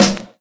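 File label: classic hh Snare; Hip Hop; STYLE KITS; Classic Hip Hop Mega Kit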